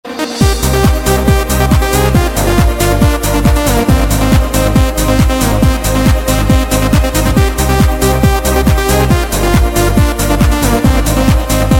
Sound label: Euro Techno